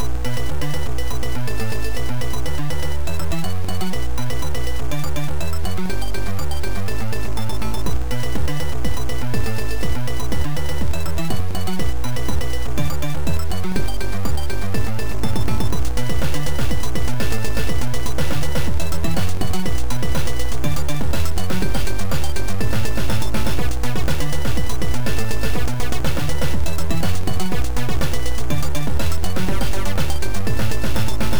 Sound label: MADNOISE